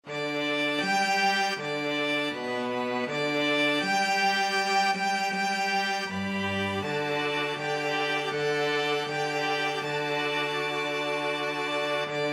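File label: australia national, string quartet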